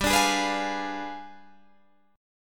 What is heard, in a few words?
G 7th Flat 9th, Guitar, Open D